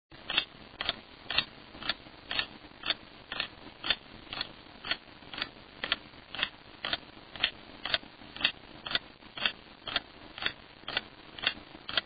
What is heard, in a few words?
tick tock